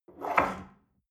espresso grab from table slide medium, restaurant cafe